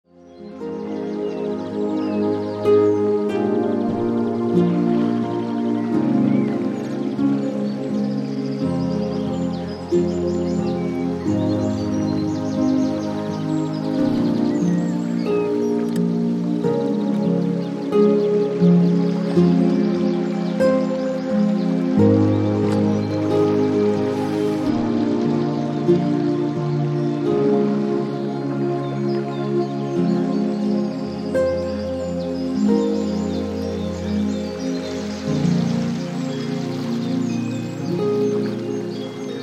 am Fluss; kurz meditation heilung in der natur; klangerlebnisse

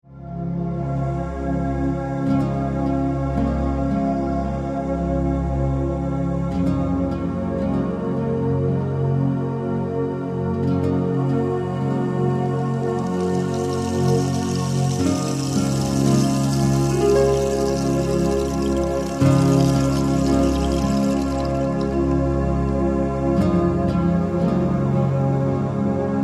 Entspannungsmusik

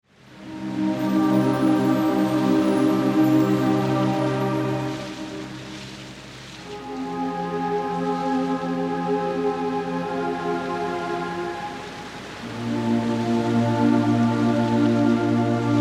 Entspannungsmusik

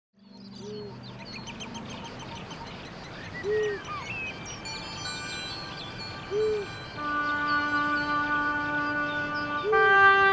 soundtr im wald; kurz meditation heilung in der natur; klangerlebnisse

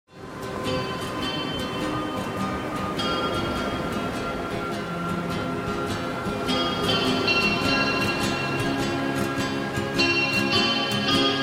hawaii vollmond sound; Orte der Entspannung; Phantasiereisen; Tiefenentspannung

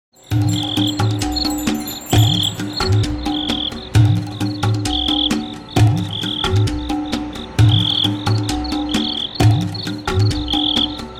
Grillen einschlafen sound; Orte der Entspannung; Phantasiereisen; Tiefenentspannung

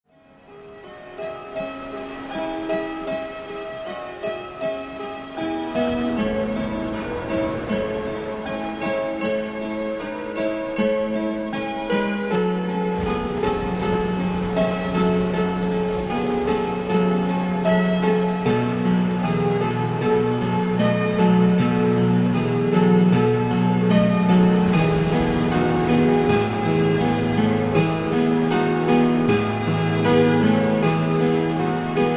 power meditation langversion sound pur

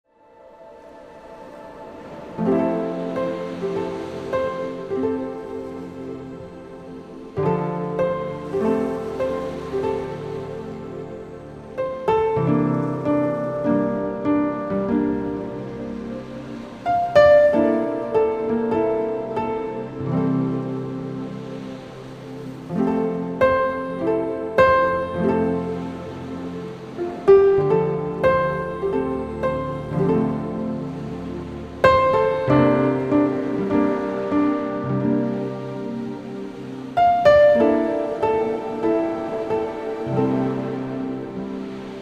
nordsee entspannung, northsea relaxation soothing sounds piano; crushing waves

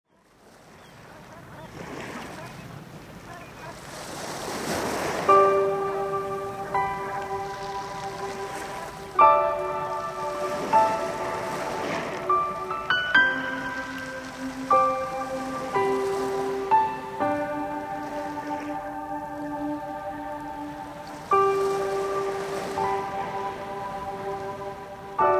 nordsee entspannung, northsea relaxation soothing sounds piano; crushing waves

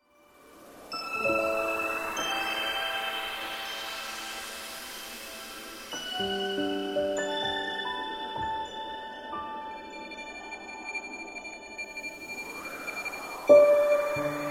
soundtr im schnee; kurz meditation heilung in der natur; klangerlebnisse

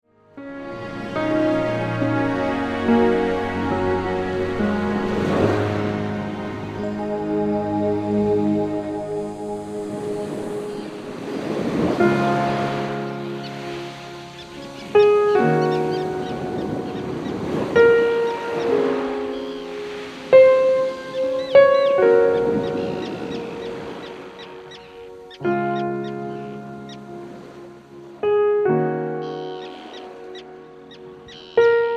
Ostsee am Morgen, Meeresrauschen, Wellen, Möwen; entspannung; syncsouls moooods

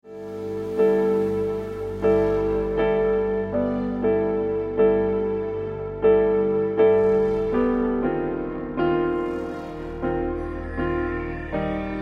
Entspannungsmusik

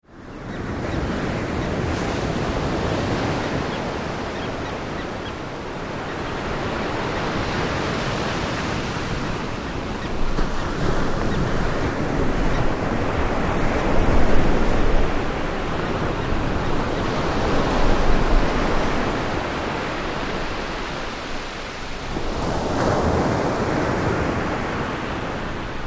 Entspannungsmusik

